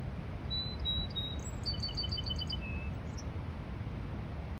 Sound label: Vesper Sparrow